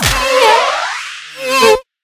kick

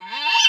staff slam; Wizard Mini

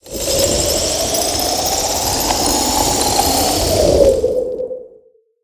freezing wind

